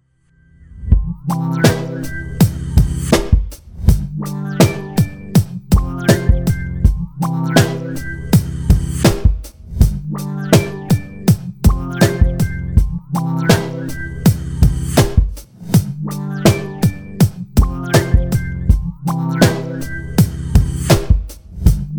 Bubbled Trans

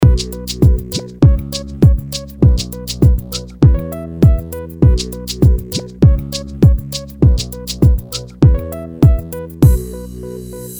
BED Bright Beater

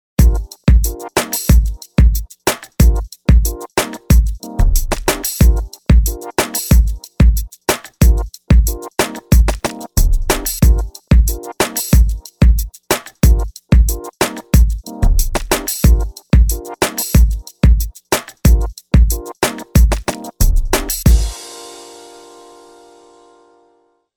BED Synthasizer

